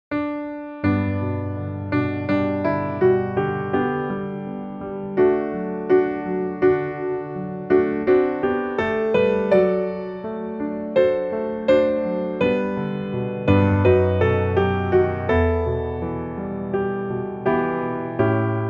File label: piano